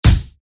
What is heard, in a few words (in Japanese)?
ブーメランぶつかる 通常攻撃 モノラル; 武器効果音